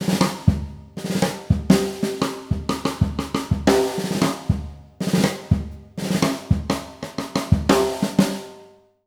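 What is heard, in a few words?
breakbeat, 120; Jazzistic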